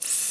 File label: drill; Akai MPC2500